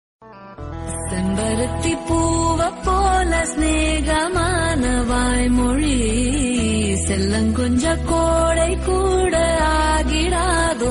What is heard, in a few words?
Sentiment Tamil Ringtone